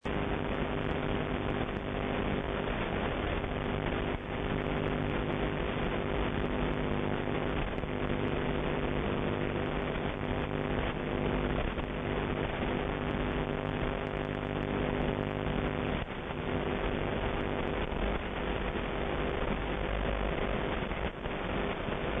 noise

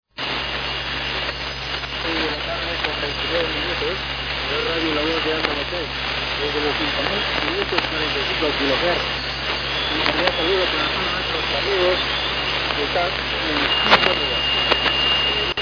Radio; Radio La Voz de Andamachay; Perú on SW

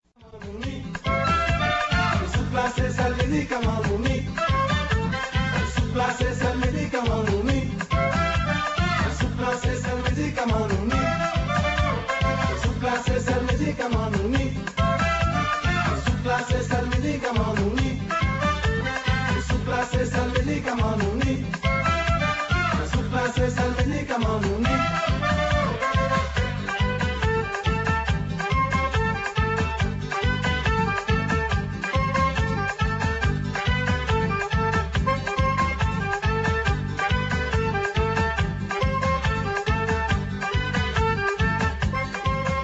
zouk

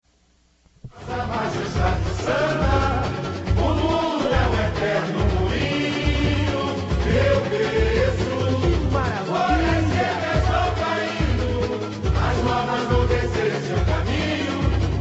samba

